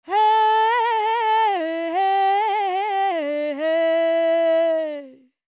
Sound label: World Voices, INDIA; ILIO; Vocal Planet